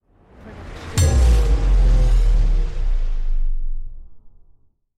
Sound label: designer magical hit